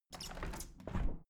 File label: window plastic squeak open heavy sequence